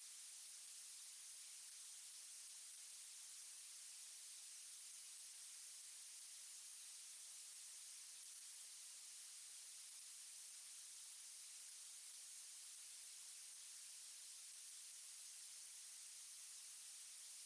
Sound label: violet long quiet, colored noise